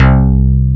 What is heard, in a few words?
FM Syn Bass, Synth Bass, Roland